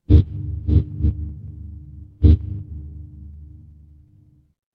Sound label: fire electric hum